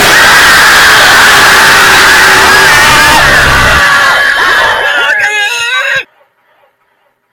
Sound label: jumpscare